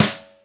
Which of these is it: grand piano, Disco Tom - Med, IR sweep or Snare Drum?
Snare Drum